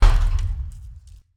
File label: metal collision, heavy metal board collision